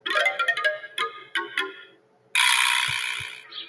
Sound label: punchline music